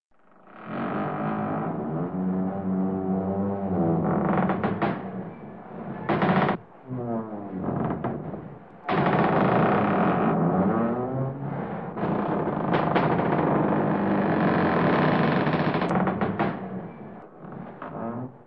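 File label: Old ship creaking from the wind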